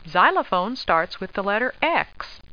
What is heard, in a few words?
xylop; Tandy Sensation II